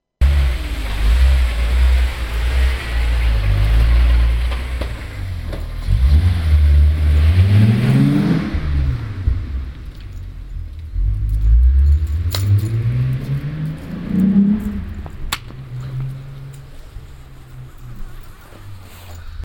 golf, verkehr

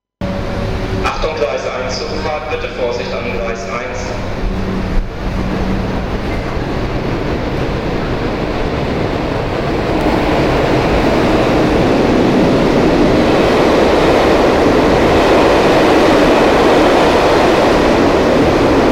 zugfahrt

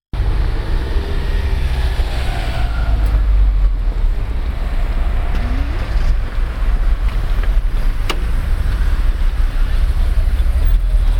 parkplatz, verkehr

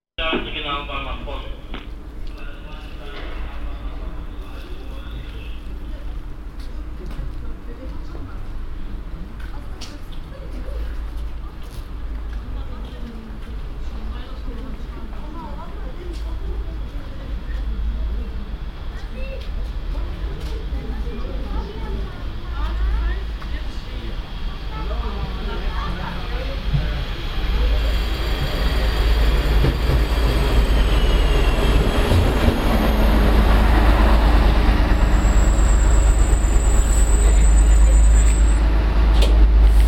zugeinfahrt witterschlick

